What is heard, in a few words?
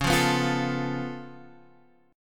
C sharp Augmented 7th C sharp Guitar Drop D; down; chord shape